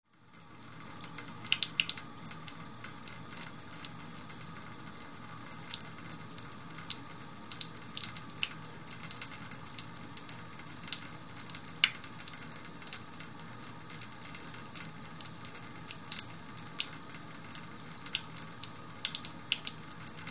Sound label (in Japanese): mizu